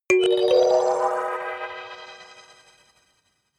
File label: notification kalimba magical fourth up